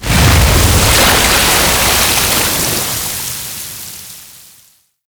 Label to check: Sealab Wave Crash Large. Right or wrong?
Right